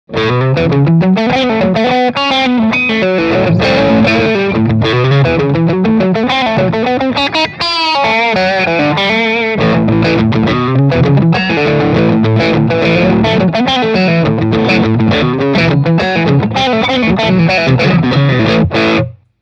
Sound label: Drive